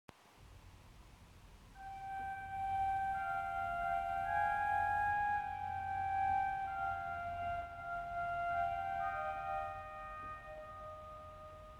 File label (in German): Principal 4, orgel